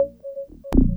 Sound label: Trance Pings, Synchro Funk, Beats; Sonic Foundry (Sony Creative Software)